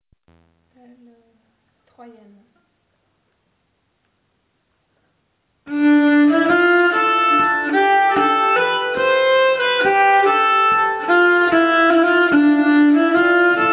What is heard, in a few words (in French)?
Troyenne Lent